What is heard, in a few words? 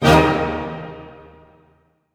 D# MAJ FF, orchestra hits; Sound On Sound - Peter Siedleczek's Orchestra